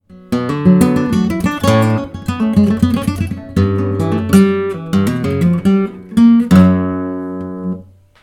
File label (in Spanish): falseta